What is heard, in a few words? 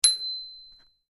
bell bell strike high and